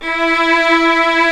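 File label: strings, 8pc, vibrato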